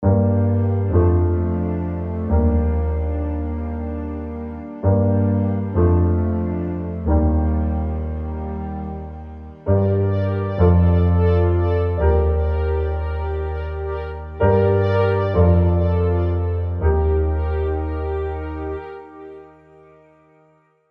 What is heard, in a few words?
Broken Ambient Keys; ASCEND; Gravity Transforma